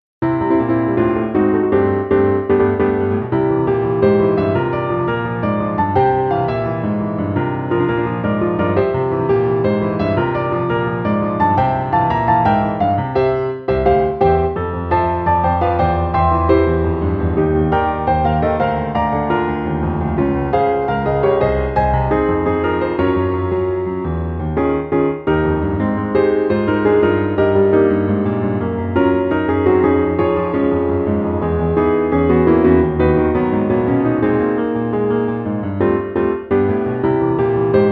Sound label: piano; Pilot Wings